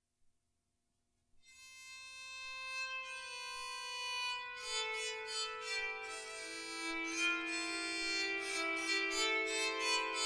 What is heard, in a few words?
Tenor, bowed psaltery; shule aroon